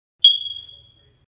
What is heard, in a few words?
Smoke Alarm Single Beep